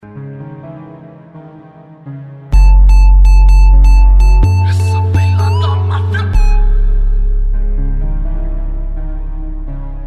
rap nacional

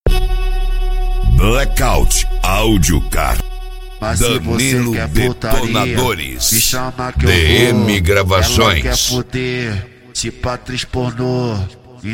eletro funk

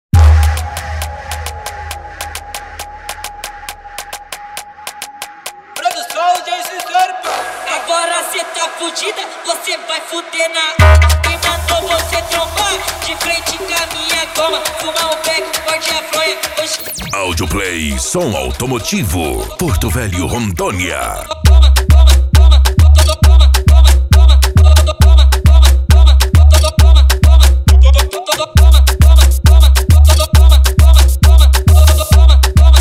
esp funk mega bass

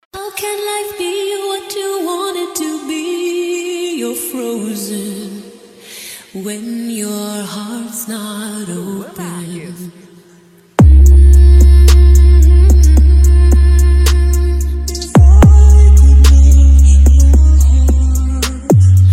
som automotivo; rebaixados

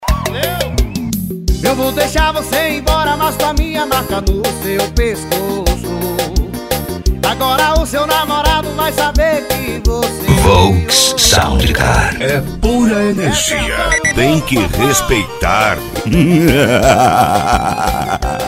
sertanejo e pisadinha; cd volks sound car

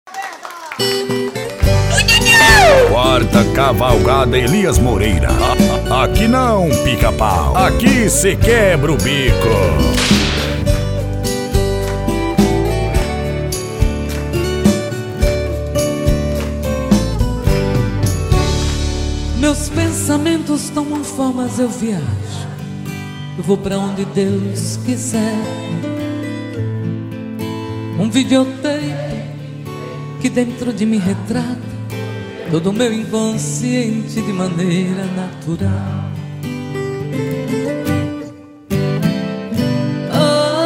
sertanejo classico

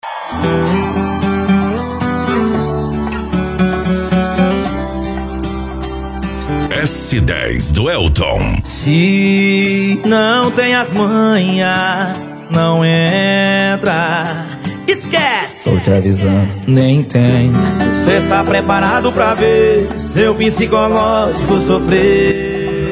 sertanejo

